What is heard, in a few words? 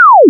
laser, Laser Shot, Digital, Game; 0.3s